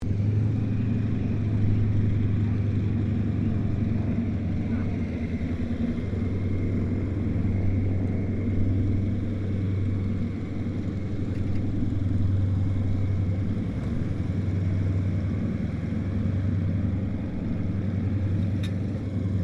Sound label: AIRCRAFT YAK82 IDLE DISTANT; Airplanes; Vehicles